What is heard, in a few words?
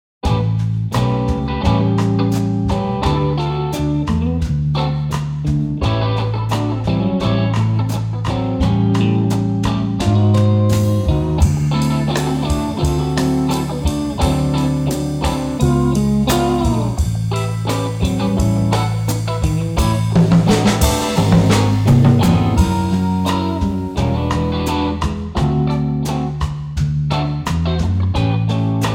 Rhythm Git 1+2 Bass Drums